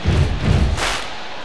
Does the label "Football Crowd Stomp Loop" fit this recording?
Yes